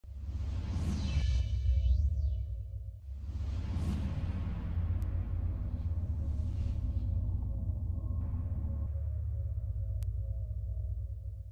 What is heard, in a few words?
Global Ambient